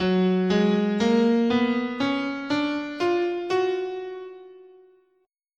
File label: F sharp Harmonic Major, scale